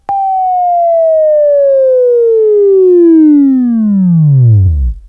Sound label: WAV Right, Sweeping; Sweeping Training program